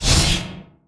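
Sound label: weapon respawn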